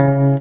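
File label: guit C#, guitar; BLOOD2; Music; Ancient; PC Gamer IT CD 37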